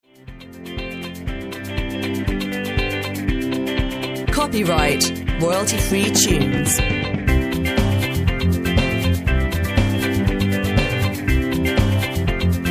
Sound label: Kalimba